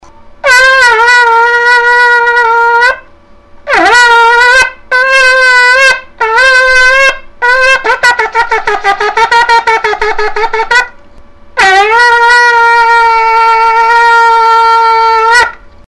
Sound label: shofar